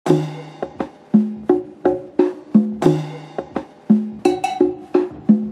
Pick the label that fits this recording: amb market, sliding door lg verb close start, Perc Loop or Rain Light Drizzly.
Perc Loop